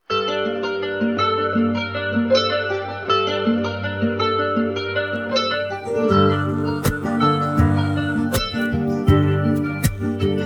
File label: Male instrument, persian; MoeinZ; MaleMani; beatestan